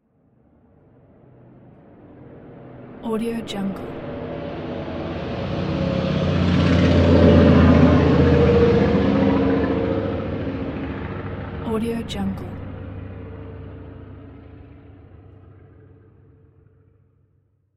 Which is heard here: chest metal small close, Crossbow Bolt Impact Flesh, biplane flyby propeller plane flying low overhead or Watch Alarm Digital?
biplane flyby propeller plane flying low overhead